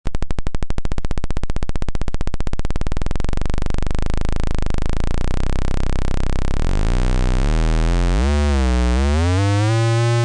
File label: Pianoh